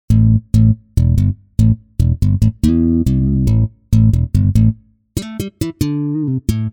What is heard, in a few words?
Snap Bass